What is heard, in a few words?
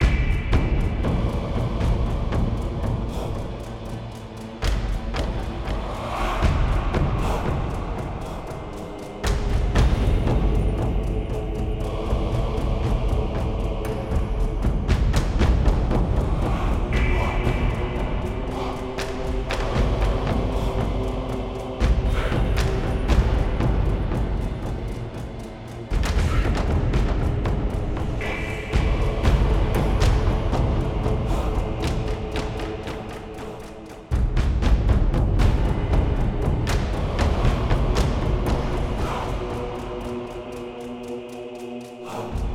Battle Catacombs East; Eon Altar